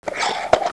OPEN MAS; HOUSE

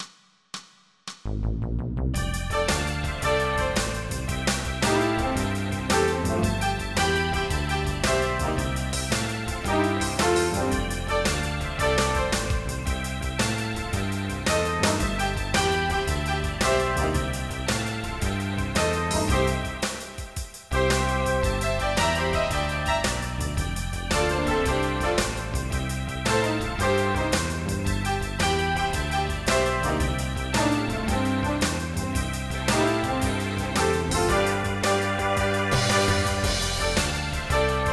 synth

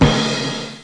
puff; GEOGRAFIA

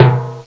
timbale, percussion instruments; Sound Effects for Multimedia - Volume 1

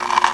Egg Crack; Toxic Ravine

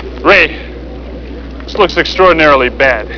ghost

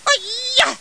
hiya; Creative Review CD-ROM 28; kungfu

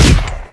Gun Fire; Net Power 2000 #2

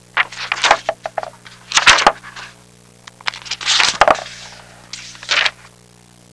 turning pages; Sound Effects for Multimedia - Volume 1

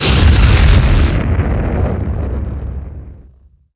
BLAST; ImpGal2; spacewar

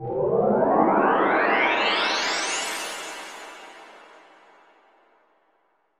AMBNT 160; Ambient

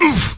ungh

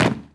land wood; ambient; Shadowman

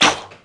Menu Popup; Mask of Eternity

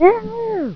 Muzzle

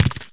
rockhit; Universo Animado

